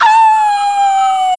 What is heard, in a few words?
wolfos alert; zelda